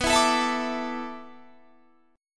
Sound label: D 6th Suspended 2nd B, chord; D6sus2/B strummed